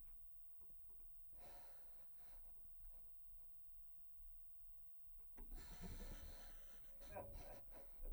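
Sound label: Contrabass DI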